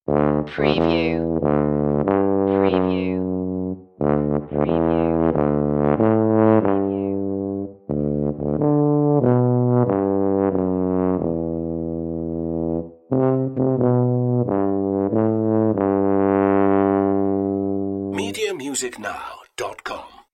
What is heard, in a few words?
happy birthday to you tuba solo alt